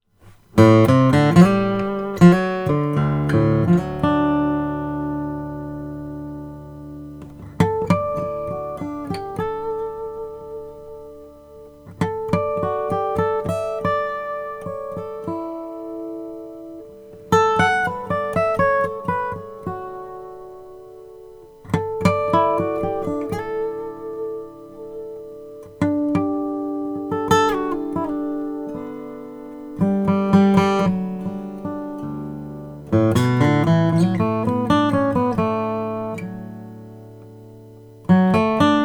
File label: om spalted pearwood, guitar; john david scott